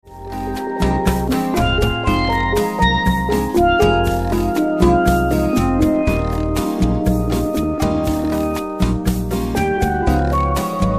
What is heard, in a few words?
Steel Drum Soloist, samba